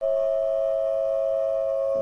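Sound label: C sharp 5 with E5, flute multiphonics; modern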